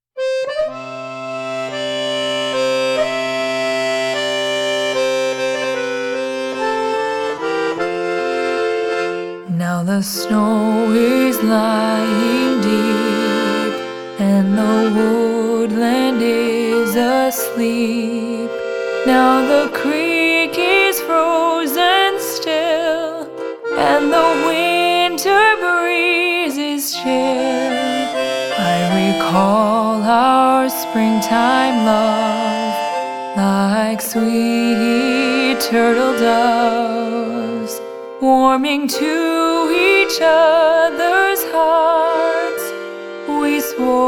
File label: Waltz